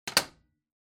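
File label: Soroban Put, abacus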